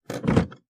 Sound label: gearshift